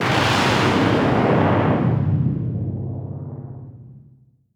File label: Fx Impact; bass house